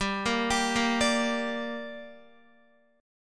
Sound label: G Minor G down Guitar, chord; arpeggiated; JGuitar